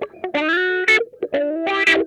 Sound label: MAD CAT, Funk Guitar; Zero G - Funk Guitar